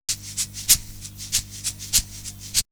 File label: Percussion; Coldcut's Kleptomania VOL1